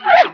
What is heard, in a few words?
roller pain; hl2